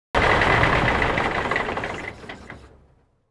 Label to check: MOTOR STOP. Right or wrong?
Right